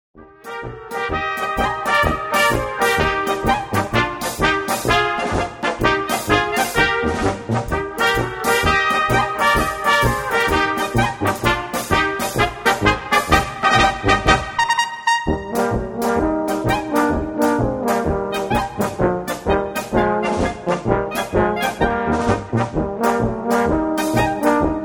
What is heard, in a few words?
Polka